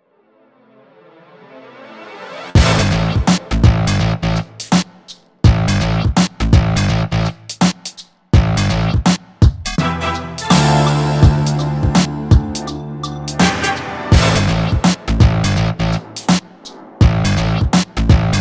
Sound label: BRICKWALL LIMITER +6dB HARD LIMIT; t-racks